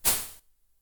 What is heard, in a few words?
grass; 落下音・はねる音